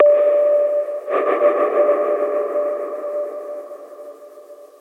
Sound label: Sonar Pulse